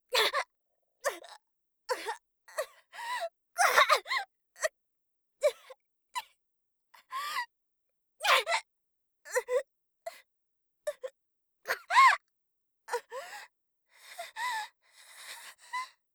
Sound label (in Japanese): 顔面を蹴り上げる; 効果音なし